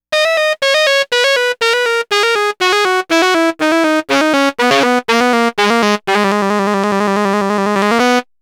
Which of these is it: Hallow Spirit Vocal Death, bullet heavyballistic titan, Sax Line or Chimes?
Sax Line